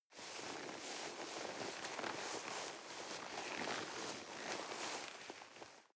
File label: nylon leather soft long